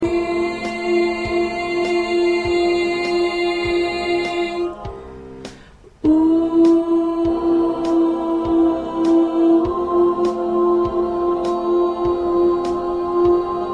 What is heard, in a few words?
1st Alto, choir; christmas songs for choir; part preview